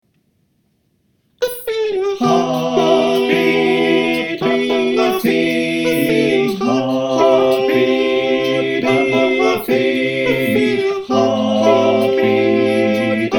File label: SATB